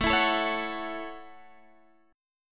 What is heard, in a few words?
A Major Add 13th A, chord